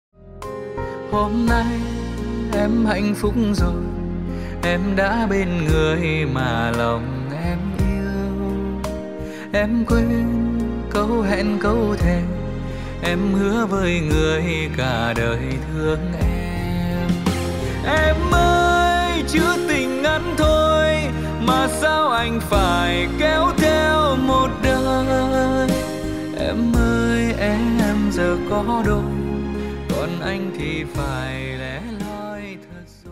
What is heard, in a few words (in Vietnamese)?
Nhạc chuông